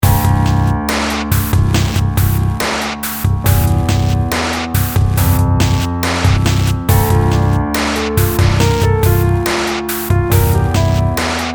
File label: piano rain